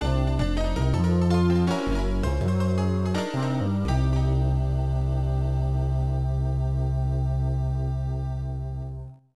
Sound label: Logo; 19xx the war against destiny cp system ii arcade